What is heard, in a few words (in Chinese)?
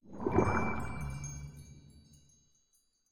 发光鱿鱼环境声